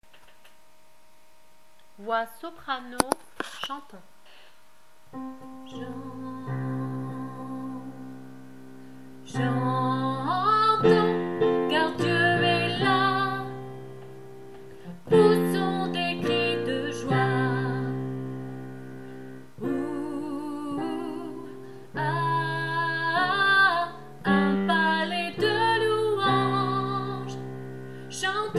Chantons Voix soprano

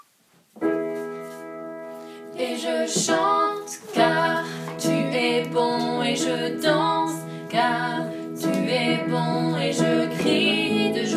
Tu Es Bon Voix Alto

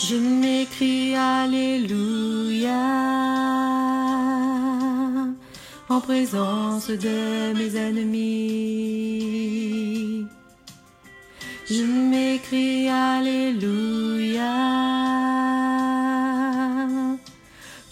voix basse couplet; Voix alto couplet

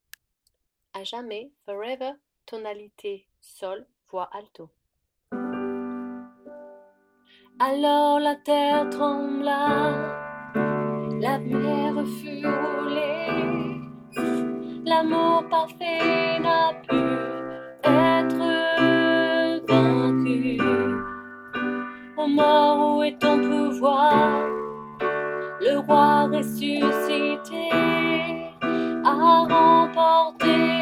voix alto

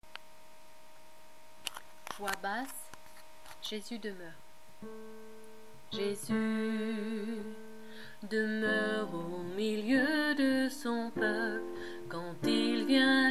chantons voix alto couplet

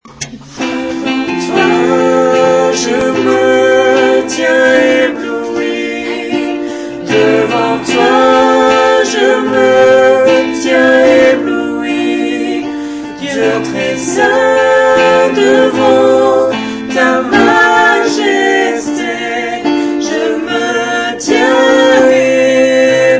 voix homme

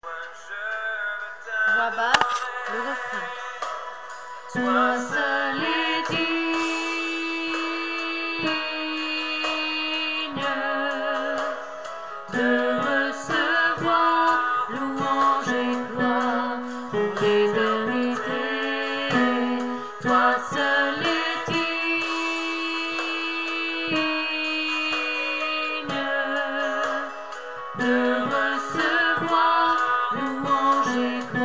voix basse refrain

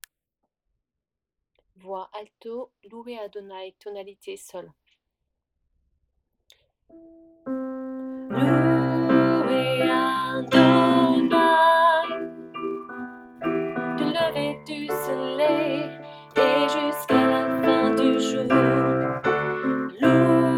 Louez Adonai voix alto

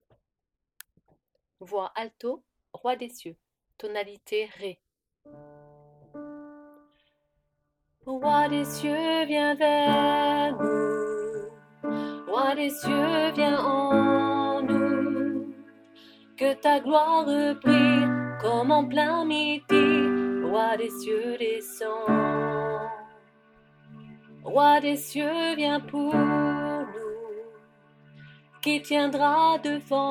voix alto

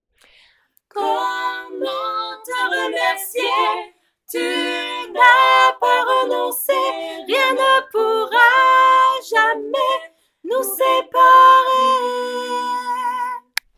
voix soprano; Notre père pont

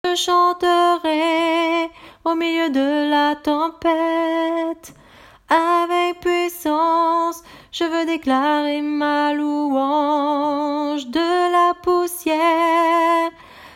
voix haute refrain

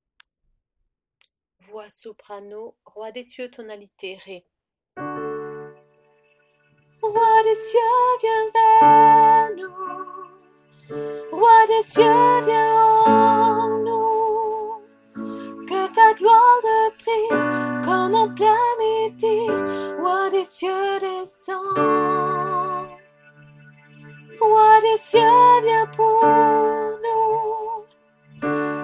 voix soprano

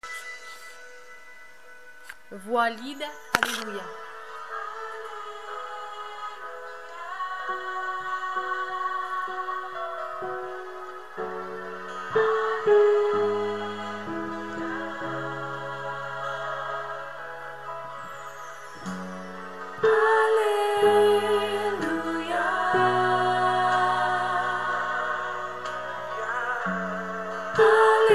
Voix lead; Alleluia

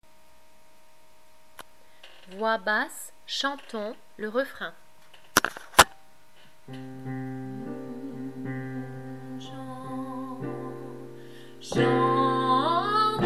Chantons voix alto; Duo

